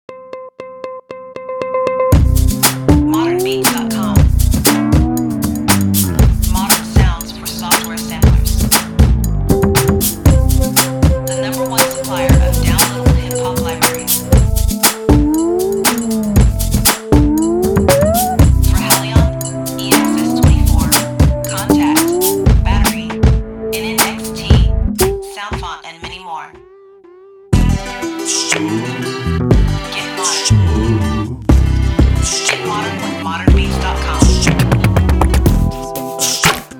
Bass Elementz

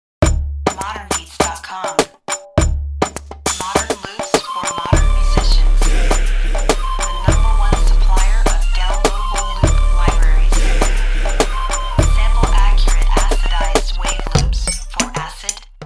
Ethnic Drum Loops

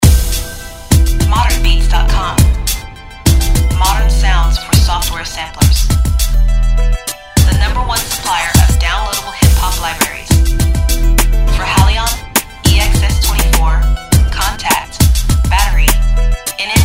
Timbalicious, drums samples

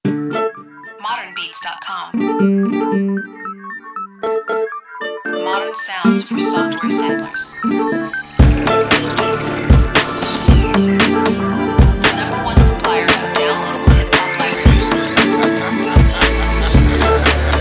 Urban Guitar Chopz

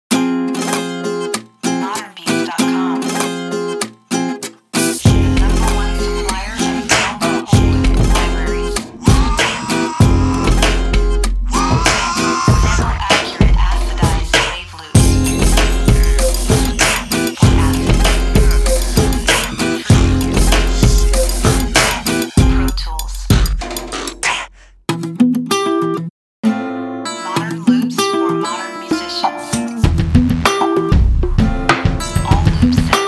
Urban Guitar Loops

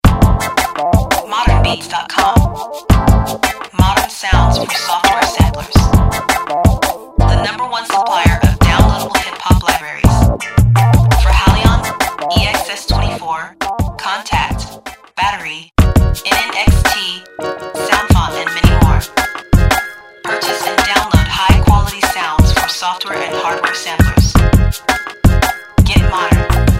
Hip Hop Chordz

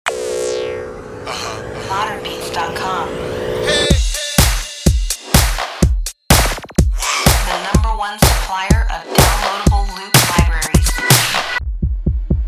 Pop Rhythmz

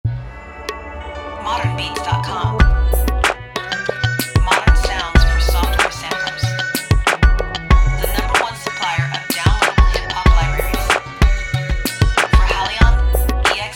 Timbalicious, drums samples

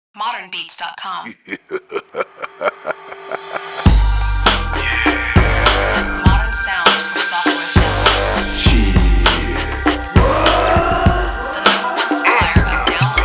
Vox Chantz

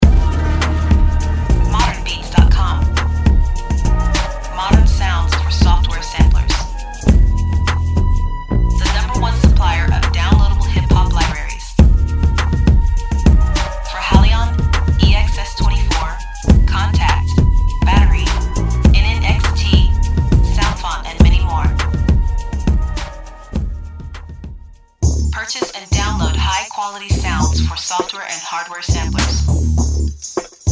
Ethnic Loopz, ethnic percussion loops

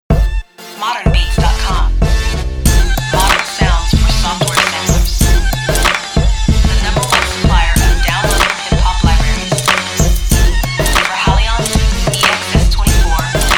Brass Elementz

